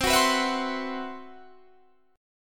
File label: C Major 7th Flat 5th C, Guitar Open D